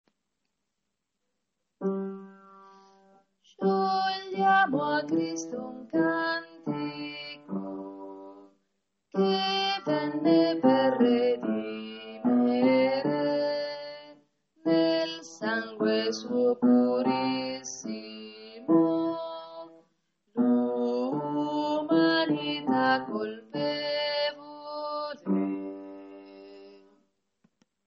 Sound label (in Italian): noi canteremo gloria a te bassi